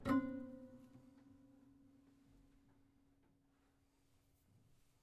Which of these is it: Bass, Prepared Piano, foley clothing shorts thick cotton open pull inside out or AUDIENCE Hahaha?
Prepared Piano